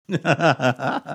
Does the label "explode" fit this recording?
No